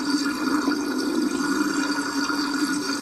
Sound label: water drain